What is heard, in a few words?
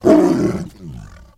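Tiger Roar Descending; big cats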